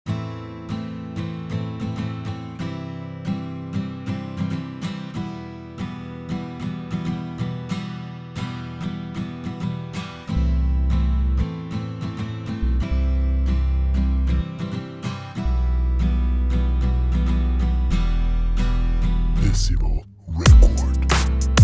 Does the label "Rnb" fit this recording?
Yes